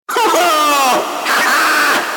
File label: blast